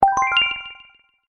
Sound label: htc notify confirm; ringtones